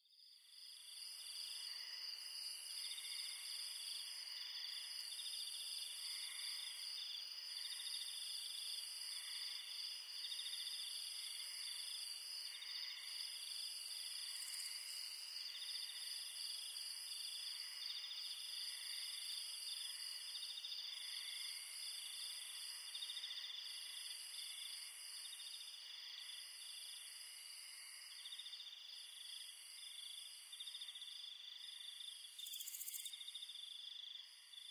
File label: Twighlight Crickets; nature sound